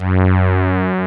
SOFT BASS; Masterbits; Dance Trax

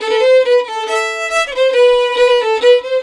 140 AM violin, Celtic Flavours; USB Soundscan vol.42; AKAI

